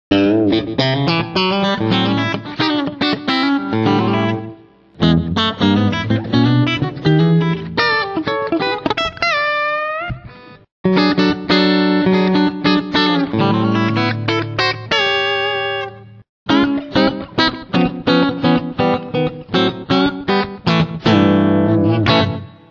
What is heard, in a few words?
Dualtone Cut Country, guitar